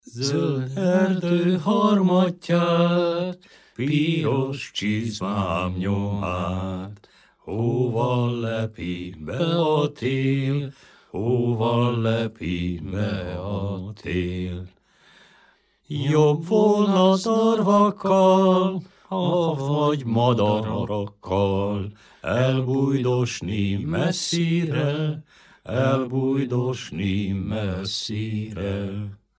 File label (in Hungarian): nepdal; zold erdo harmatjat; nepdalok